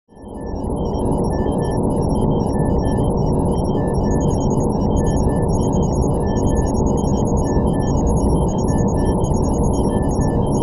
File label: Submarine instrument panel